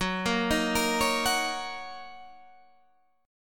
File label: Gb 6th, Guitar Drop D